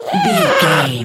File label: goblin fairy growl frustrated